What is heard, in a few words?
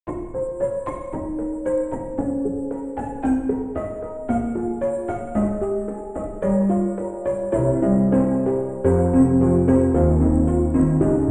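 Broken Piano; TotalLoss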